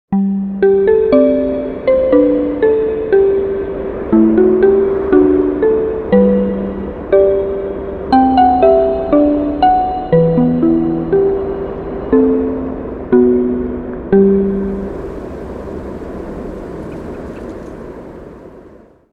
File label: weather alarm wind; OnePlus OxygenOS 13